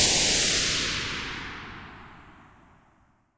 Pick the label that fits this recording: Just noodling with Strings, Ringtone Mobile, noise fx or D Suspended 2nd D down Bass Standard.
noise fx